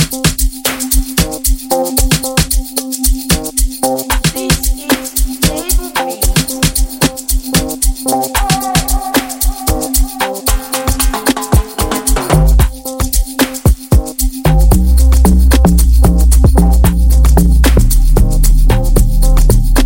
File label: Freebeat Moneypiano; Prod By Able Beatz